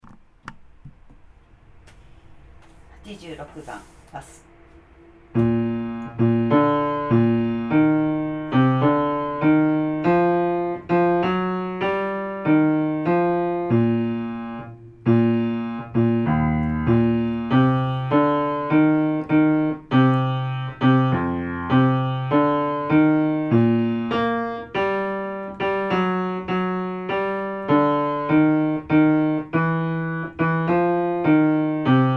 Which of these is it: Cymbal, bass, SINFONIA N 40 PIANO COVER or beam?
bass